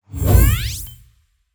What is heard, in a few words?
Monster; Zenless Zone Zero